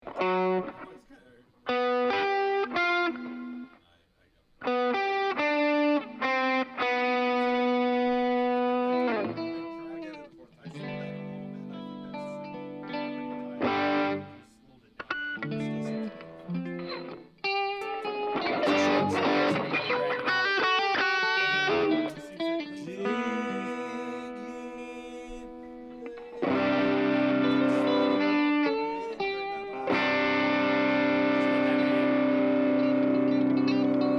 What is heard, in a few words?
Strings Only